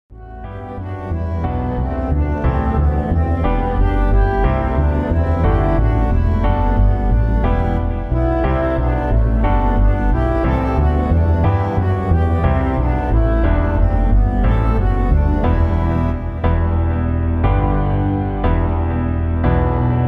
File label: Tinnians Waltz; halftoe sampler